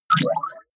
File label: sci-fi click positive medium